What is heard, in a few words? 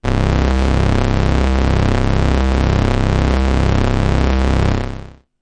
bass C1; instruments multisampled